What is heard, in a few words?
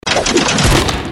Tentacle Hit Wood; Ben 10 - No Arm Done